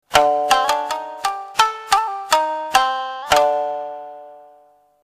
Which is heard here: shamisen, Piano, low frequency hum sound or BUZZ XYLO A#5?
shamisen